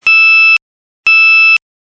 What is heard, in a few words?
User Select High Seven Plus; dexcom g4 platinum